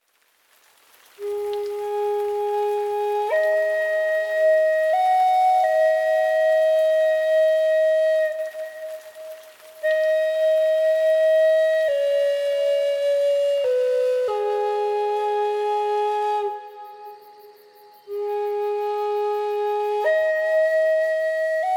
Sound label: Instrumental